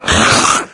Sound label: pain, maggot; doom3